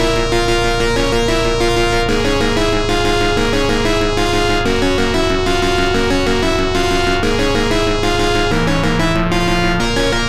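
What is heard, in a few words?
background music; Side Arms Hyper Dyne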